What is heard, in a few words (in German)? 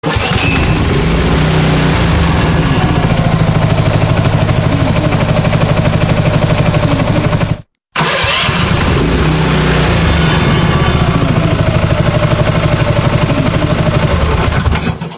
Traktorenstart; Traktorenstart Klingeltöne